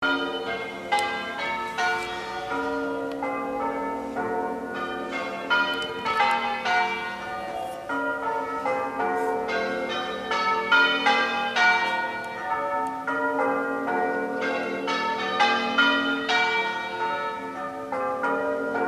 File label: bells muffled; Ticehurst